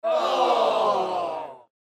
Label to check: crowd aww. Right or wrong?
Right